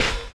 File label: LO FI OH; LO-FI KIT; USB Soundscan vol.11; AKAI